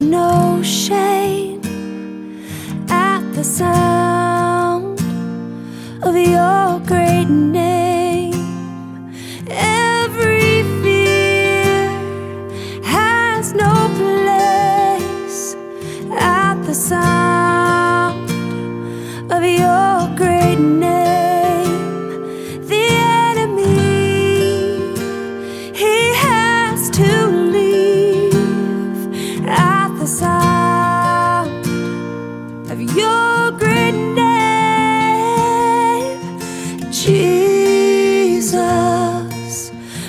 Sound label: Acoustic